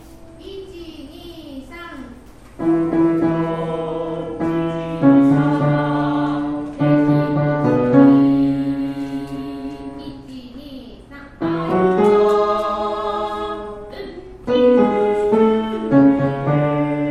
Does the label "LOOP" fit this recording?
No